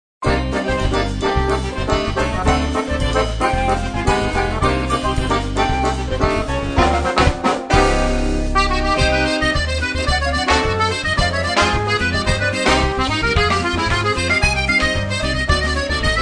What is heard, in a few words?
samba